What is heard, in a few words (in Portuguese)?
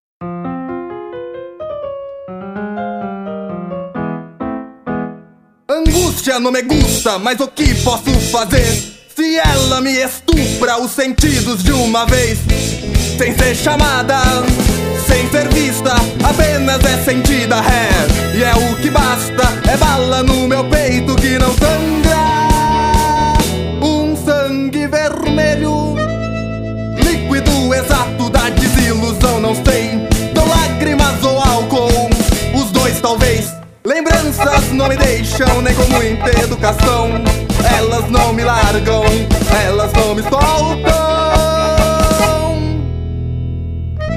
tango entre vizinhos